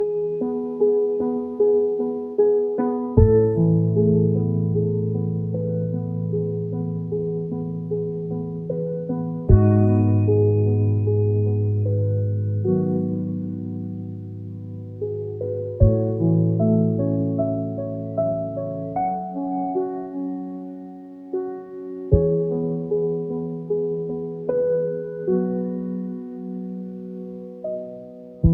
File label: piano calm